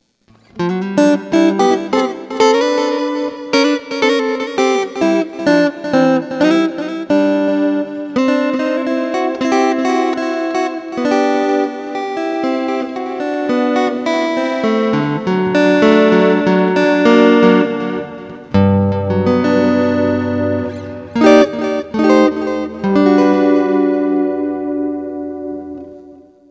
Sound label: MODULATED CLEAN, Boss GT-8